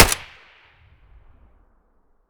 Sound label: saiga fire silenced distant; Escape from Tarkov